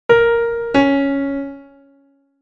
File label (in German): Intervalle sukzessiv